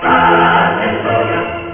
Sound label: hallelujah; worms dc; tykes